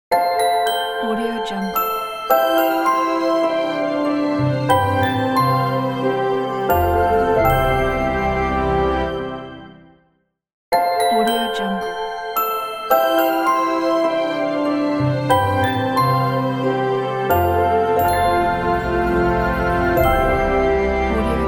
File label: classic logo; davidmackaymusic